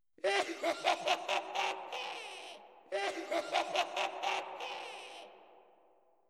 Scary Sounds Witchs Laugh; ringtones

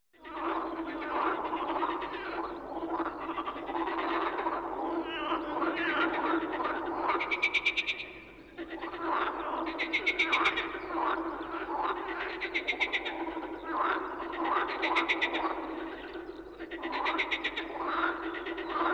Gremlins; Scary Sounds; ringtones

